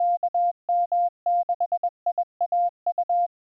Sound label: morse; 18dB